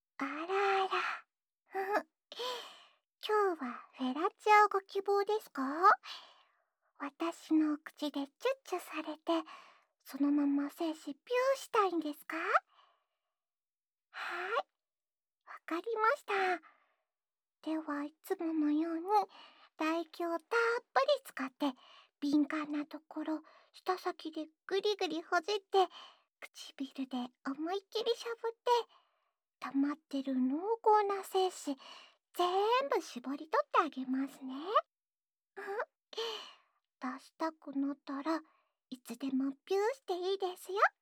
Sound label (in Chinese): 口交